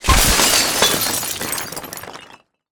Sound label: crash rocks glass